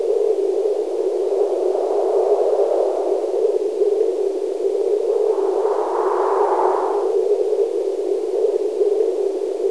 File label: beach wind; overlord